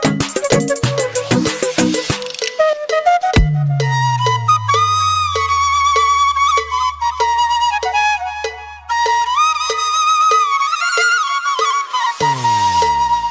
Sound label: huawei honor original ringtone